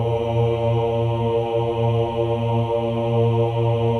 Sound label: choir ohs A#2; Spectrasonics; Symphony of Voices